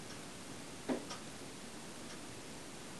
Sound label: knock